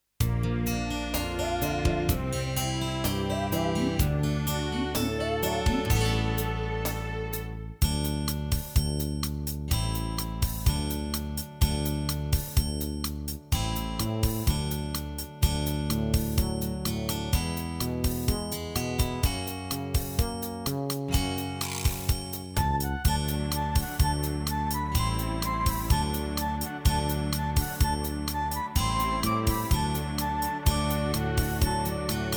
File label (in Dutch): karaoke